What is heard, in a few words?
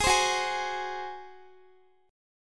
Gb Suspended 2nd Flat 5th Guitar 4E,4A,5D,5G,5C,6F; strummed